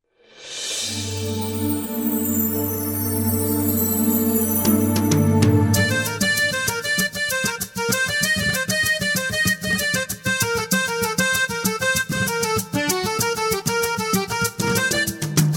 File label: Rain Drops